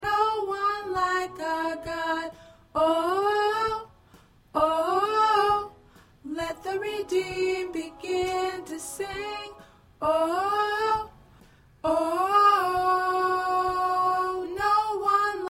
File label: Alto, choir parts, gospel songs